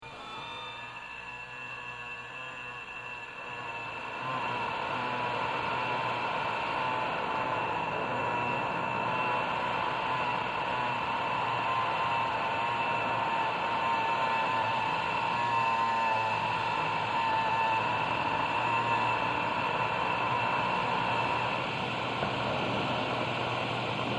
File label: Horn